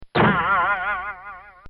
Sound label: Cartoon Sound Effects Boing; Cartoon Sound Design